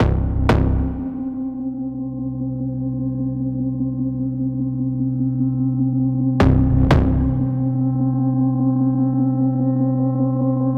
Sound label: Music Tension Pulse Low Tempo; Battlefield 3